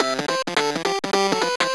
Level complete; arcade; FIFjr

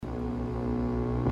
Space Duel Shield

